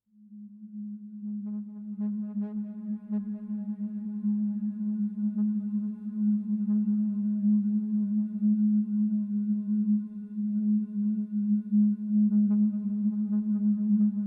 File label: Neutral Drone; Nordic Nights Drones